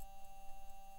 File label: mic, mars2020 edlcam